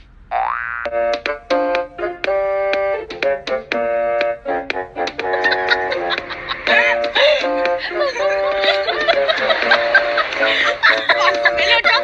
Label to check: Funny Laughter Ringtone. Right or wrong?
Right